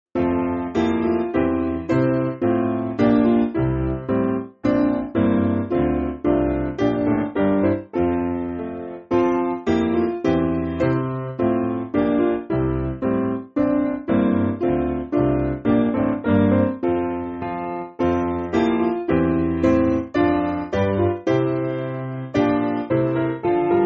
Piano, church music; Judge Eternal Throned; St Leonard

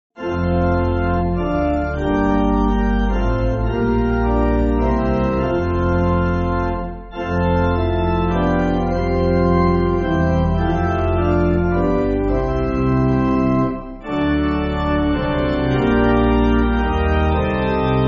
Pipe, church music; Hark My Soul; Petersfield

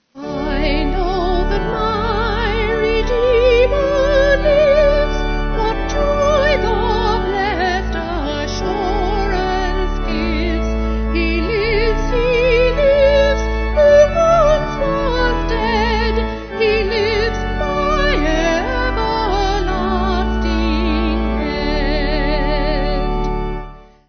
Vocal Organ

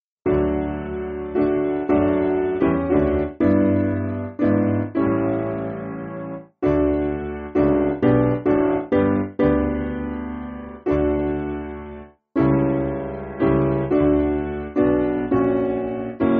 Jesus Stands Outside Roslein Piano; Simple Piano

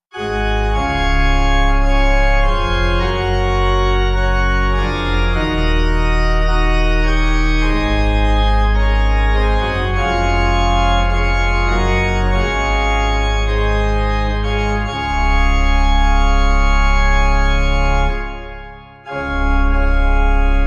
Db No Refrain Pipe

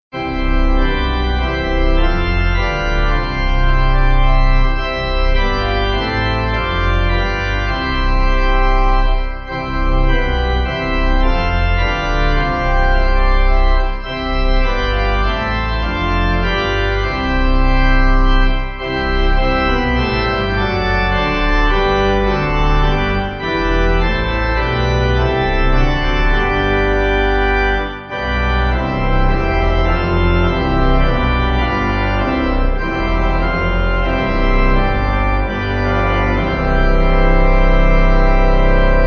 Pipe; All Glory Laud; St Theodulp

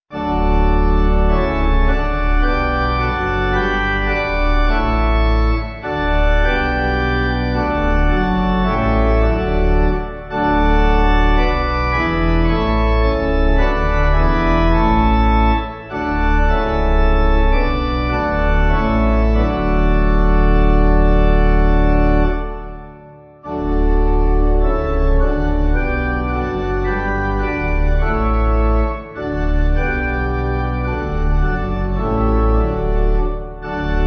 Pipe; London New

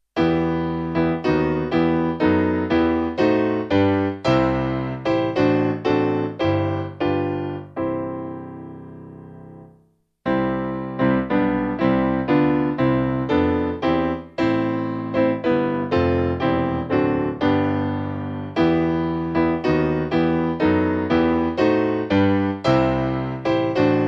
Father Sending Your, Piano; St Andrew

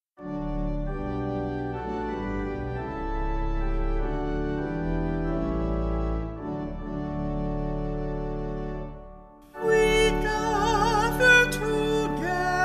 Vocal Organ

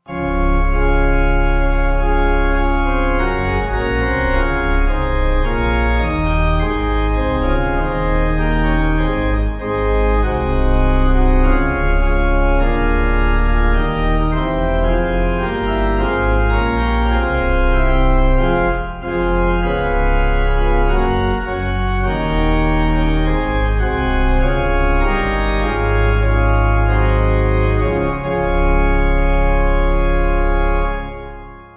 Db Pipe; Eternal Father Strong; Melita

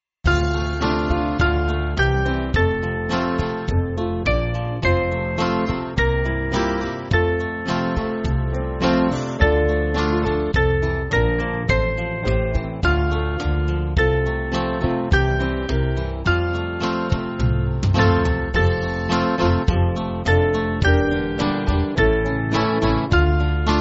Band, church music; O Come And Mourn; St Cross

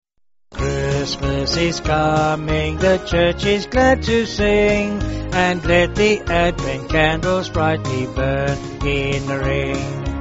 Advent Ring Vocals

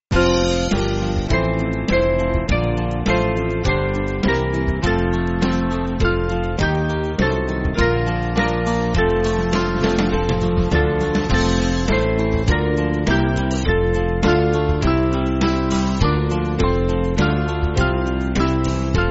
Band; Lift Up Your Heads; Praetorius

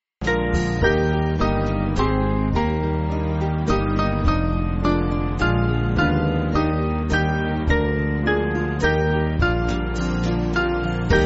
Band, church music

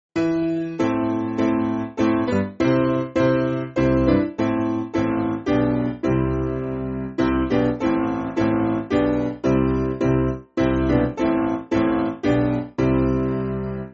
Normandy, Piano; God Holds Us

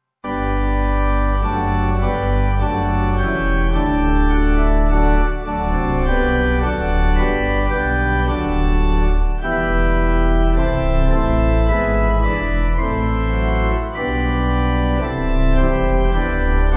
Pipe; St Peters; Chester

